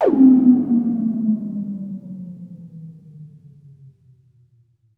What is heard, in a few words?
noise fx; Masterbits; Switch FX